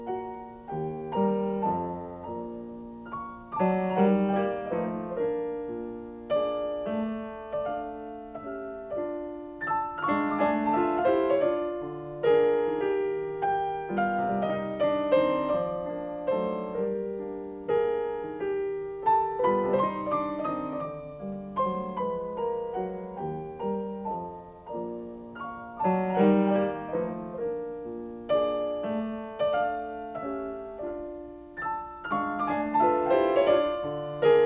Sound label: Carnaval for piano; Schumann; Op9